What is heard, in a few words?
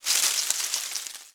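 bush movement; Call of Duty Modern Warfare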